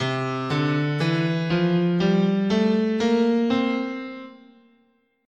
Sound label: C Mixolydian, scale